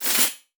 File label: Multimedia Internet CD-Rom Flash Click Mechanical